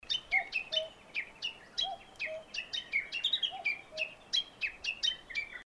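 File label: Japanese bush warbler, valley; ugu tani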